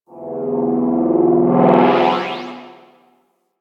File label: dark texture; Warframe; Corpus Railjack; Ambience